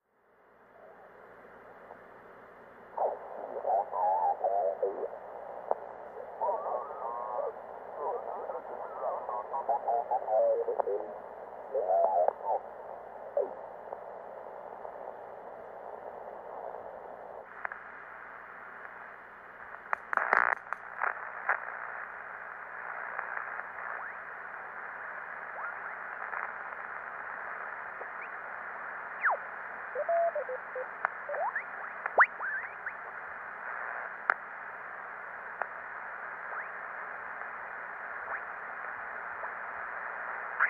Futz Radio: Radio Static And Warble. Effect Has Both Vocal And Electronic Aspects. Mono